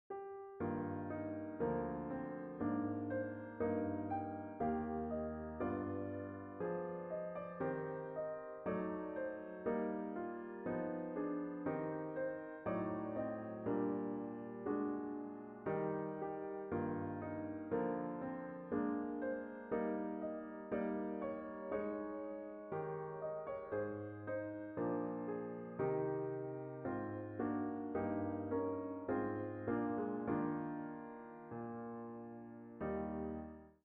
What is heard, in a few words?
An example with augmented dominant seventh chord